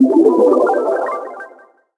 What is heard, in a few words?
elixir collect